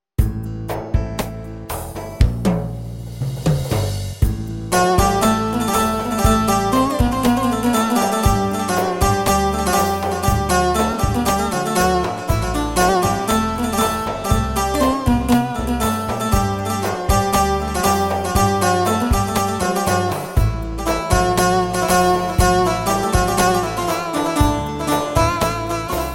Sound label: Half Slow